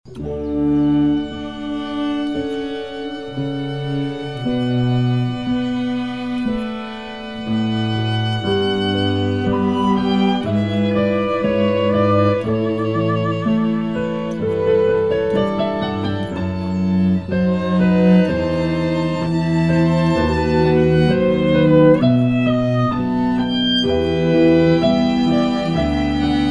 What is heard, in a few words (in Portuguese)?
Piano e Cordas